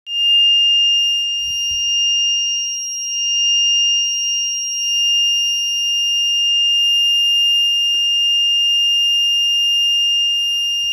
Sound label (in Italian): allarme evacuazione generale; spada e ossoli